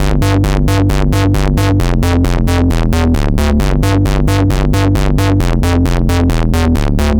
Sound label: C64 Bass, Commodore 64, Chiptune; 8-Bit